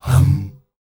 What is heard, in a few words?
HUM HUM, World Voices, S.AFRICAN; ILIO; Vocal Planet